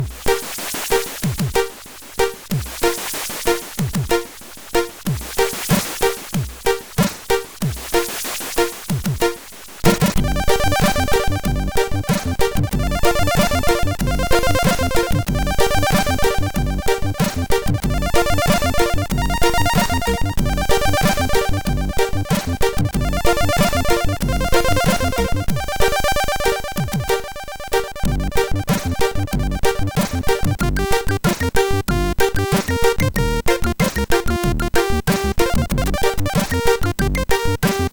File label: Reggae; Smilin Reggae; MOS6581R4; hvsc